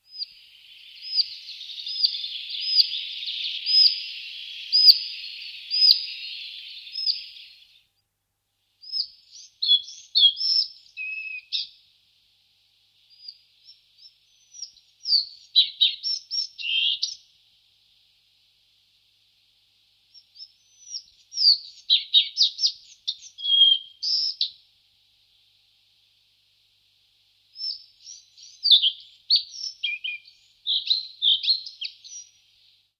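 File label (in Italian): balia dal collare, Canto degli uccelli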